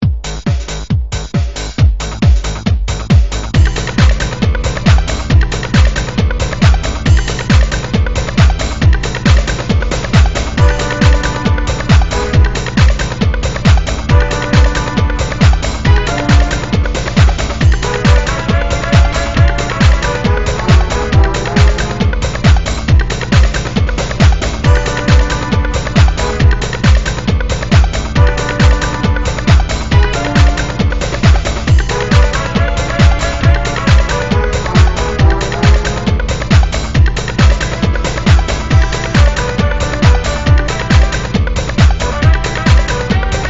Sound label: menu music; kabloey redux